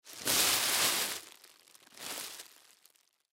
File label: Bag Open Crumple Grocery; Rustling; Foley